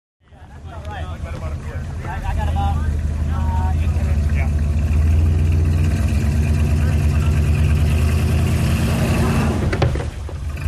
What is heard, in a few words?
Drag Boat Loading